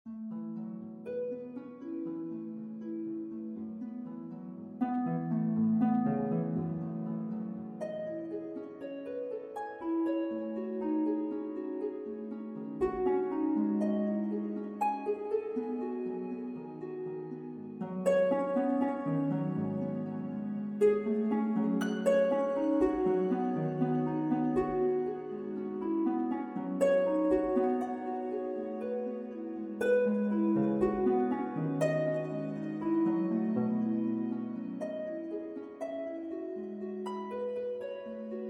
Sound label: harp alone